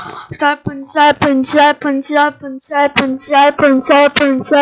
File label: sharpen